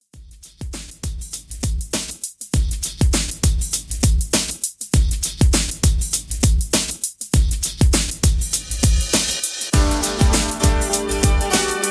90s Redrum 100Bpm

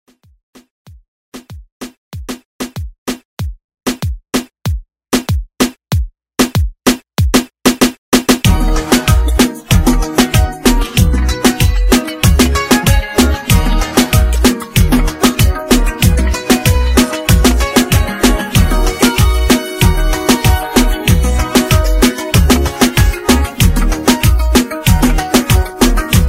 Reggaeton; Redrum

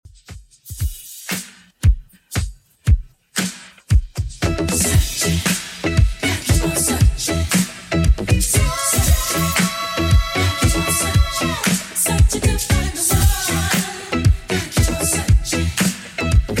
80s Redrum, 116 bpm

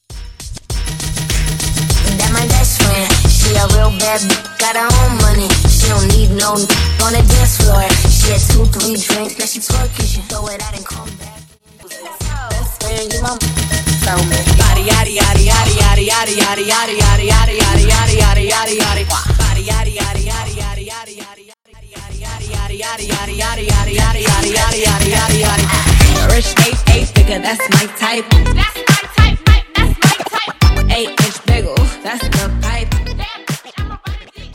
Hip Hop RB Megamix

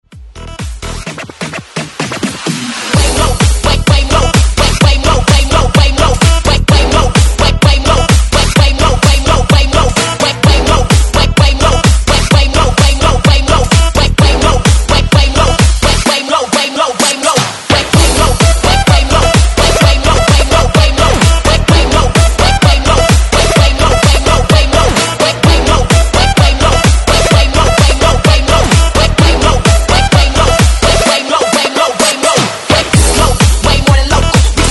Bounce 128Bpm; DJDOLLS; Redrum; remixplanet

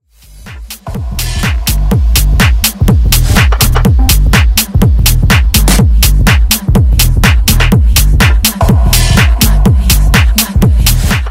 Bass House Remix 124BPM; SNIPPET

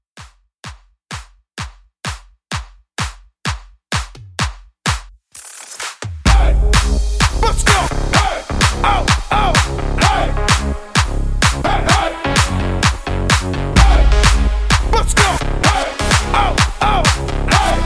Hype Party Starter 128

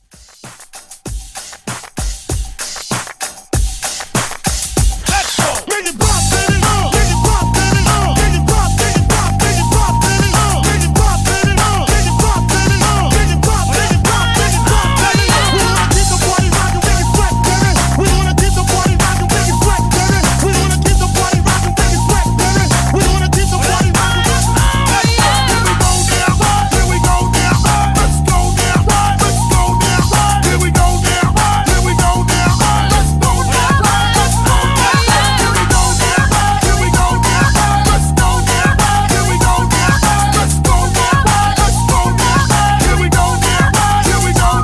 Hype 97

